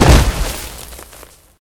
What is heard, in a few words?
cave grounds fall; Golden Crayon Sounds